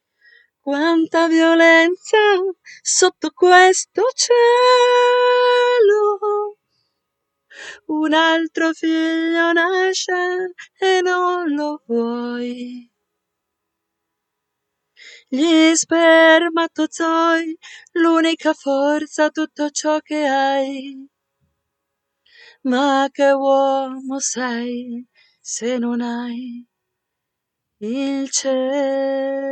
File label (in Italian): soprano